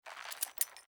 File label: boar pack jingle; npc; courier